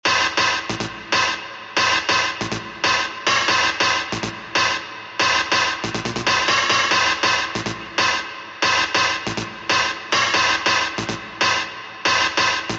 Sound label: bass